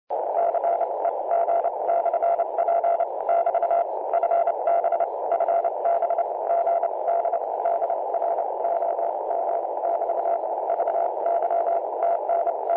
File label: RX